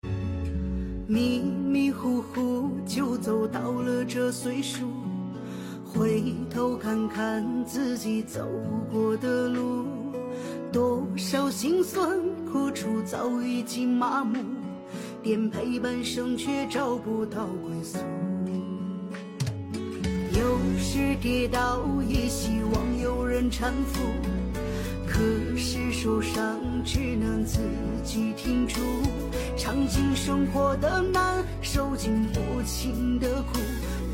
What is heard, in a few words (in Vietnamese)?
tieng trung quoc nhac hoa